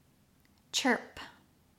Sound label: chirp